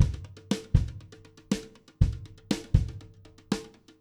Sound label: Brushes, jazz